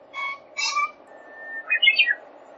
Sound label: Kiji & Uguis